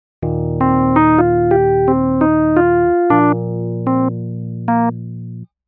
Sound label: Climb staccato pattern